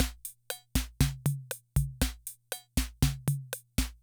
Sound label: Loop, rhythm 55, classic machines